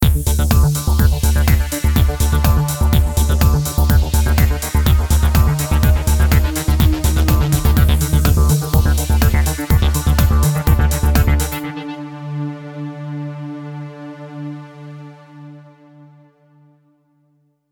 Signature Sound; FXHome; Signature Tracks

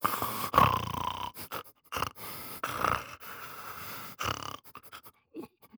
FUN MONSTER Piggy bestial sad groan

